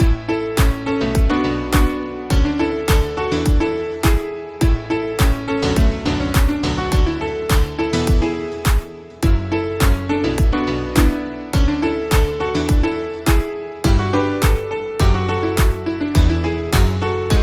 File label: Glitch Remix; Pony Music Archive